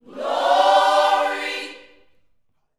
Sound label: YOUTH CH PHRASE, Vocal Planet Gospel